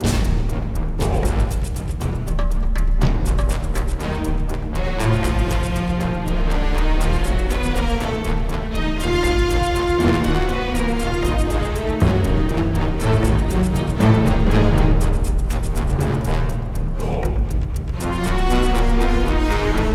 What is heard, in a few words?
Combat